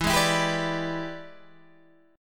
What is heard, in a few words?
E 7th Sharp 9th Guitar Open G, chord